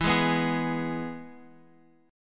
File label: E Major guitar chord, Open F; strummed; JGuitar